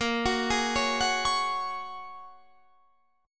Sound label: A sharp Minor 7th Sharp 5th, Guitar, Standard; 18,21,18,18,19,21; A#m7#5 arpeggiated; capo=3